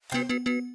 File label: game time out